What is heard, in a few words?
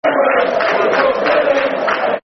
Could Be Worse, We Could Be Leeds, Football Chants; Derby County Dcfc